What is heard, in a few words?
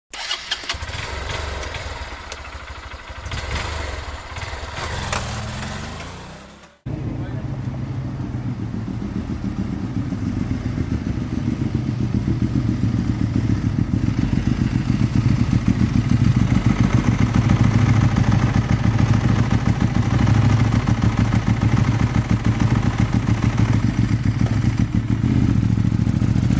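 Motorcycle handle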